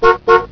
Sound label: honk honk